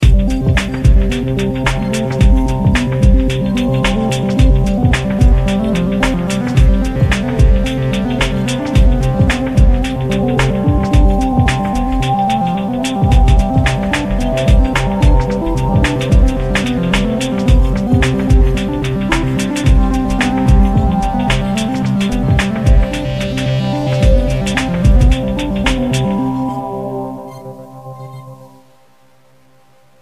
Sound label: soccer win